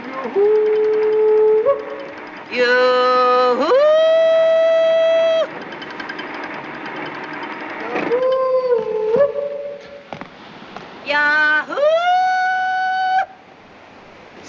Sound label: BOOM YODEL, ringtones